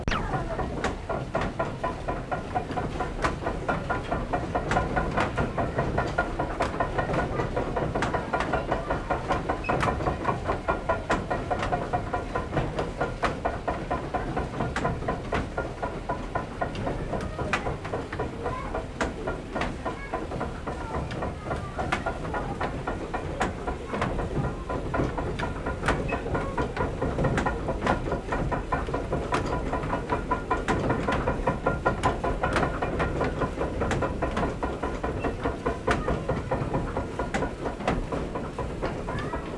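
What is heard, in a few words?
Drive belts, mill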